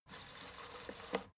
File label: fader plastic stutter soft short